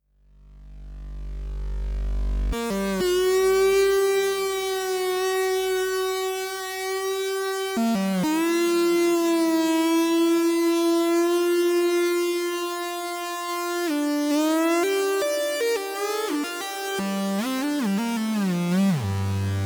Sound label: Sequential Prophet T8 e Guitar